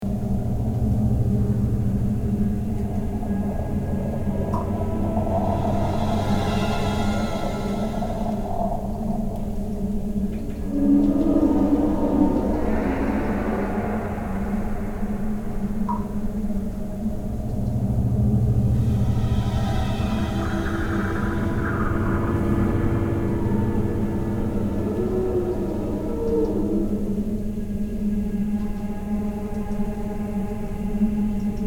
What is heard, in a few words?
music Basement; Stanleys